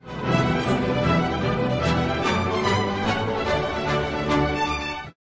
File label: Rolling Rhythms Theme